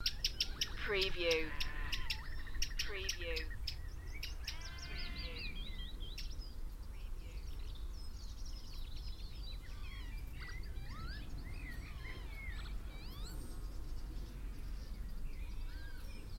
Spring birds song with aircraft noise in the background Beacon Fell Preston; beanstalk audio